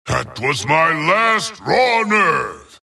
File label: death long; dota; skeleton king